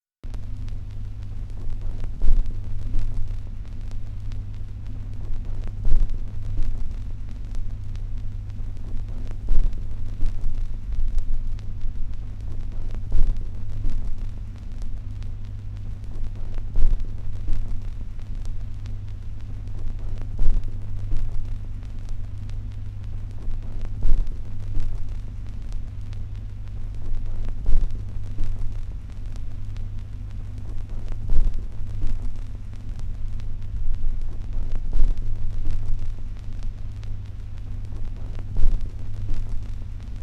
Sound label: Rua da Alfandega, Lisbon